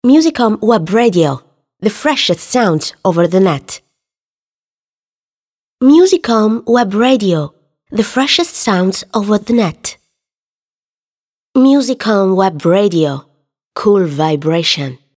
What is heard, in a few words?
Jingle music on web radio